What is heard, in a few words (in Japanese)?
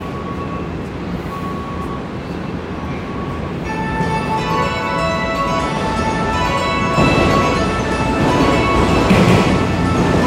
小田急線相模大野東接近, train sounds